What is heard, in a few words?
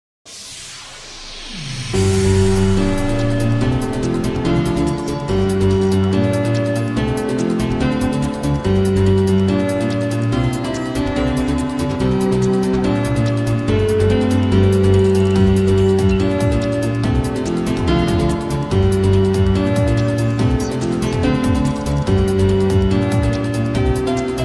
Piano